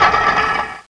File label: push